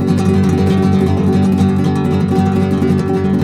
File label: G# MJ, Guitars; Spectrasonics; Hans Zimmer Guitars VOL-2; FLAM TREM CH